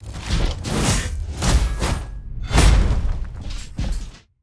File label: Badger Power Strike